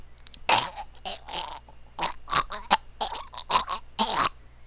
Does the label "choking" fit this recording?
Yes